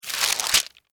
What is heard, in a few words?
Extermination, Fly, Paper, Down, Insect, Bug; Animals:Insects